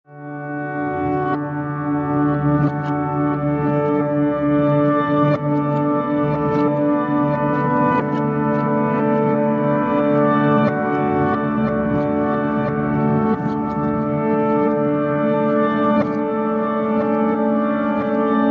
Ethereal Pulse

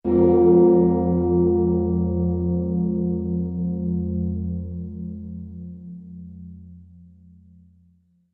Sting Bells

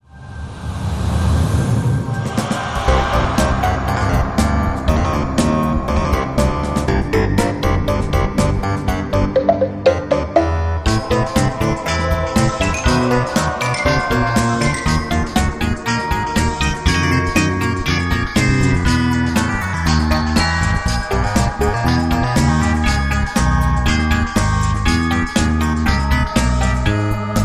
Harpsi Madness

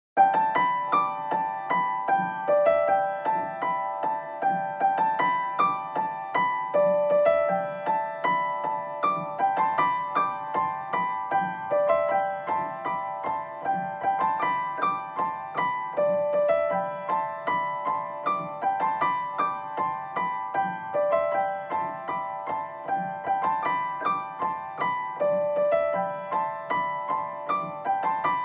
Sparkling Pianos

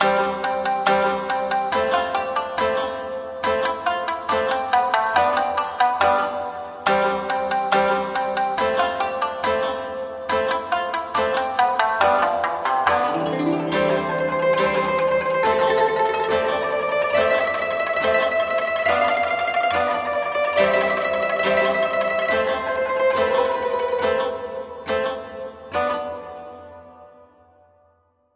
Koto Fusion

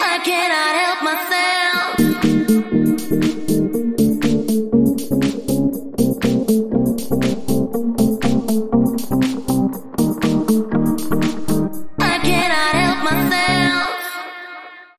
More Guitars

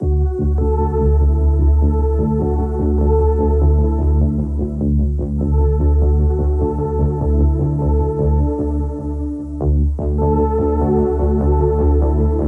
Hook

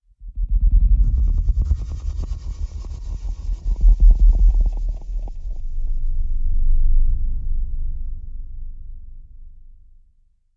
Sub Fltr Antebellum

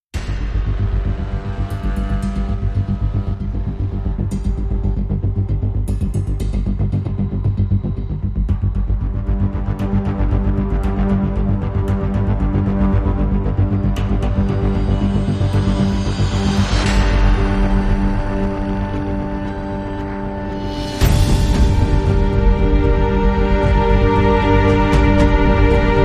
No Drone Tones

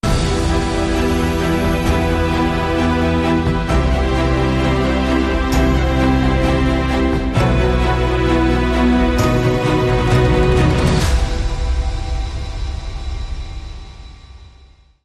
Formal Suspense, Bumper

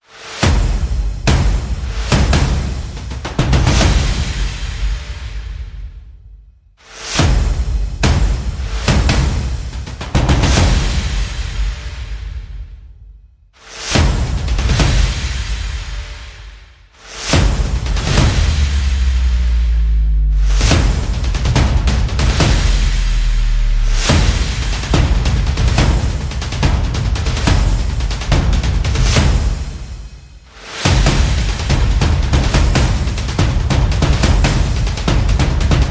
Percussion and FX

